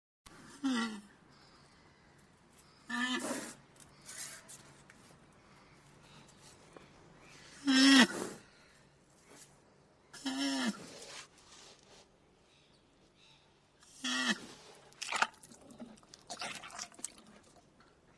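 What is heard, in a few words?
RHINO close up GROANING CHEWING; wildlife sounds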